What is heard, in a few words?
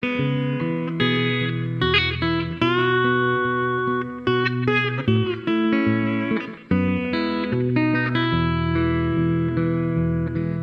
Electric Slide Guitar Ballad